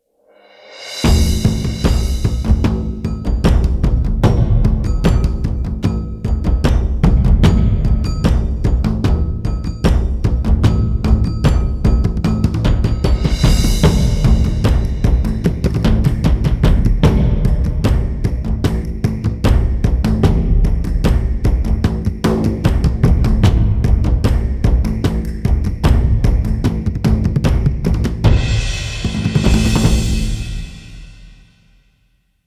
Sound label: Perc Bavarians